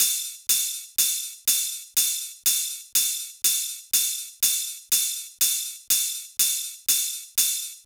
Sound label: Deep House 122bpm Ride; EDM Drums; Drum Kits; Stems; Ghosthack; Ultimate EDM Drums; Deep House Kit 10